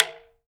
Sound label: TALK DRM, Heart of Africa; Spectrasonics